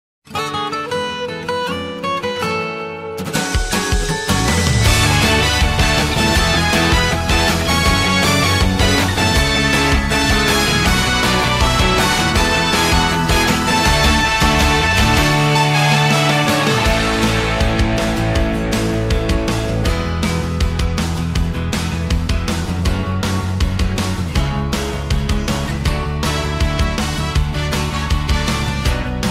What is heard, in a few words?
off vocal